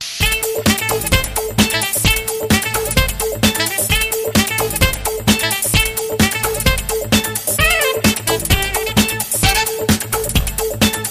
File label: Funkadeclic